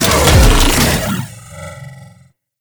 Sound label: Grendel Deluxe Bowl Stop; Warframe